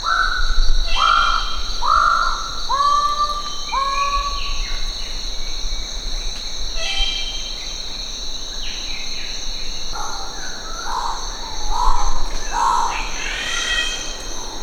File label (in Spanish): sonidos Paradisaea decora ave del paraiso goldie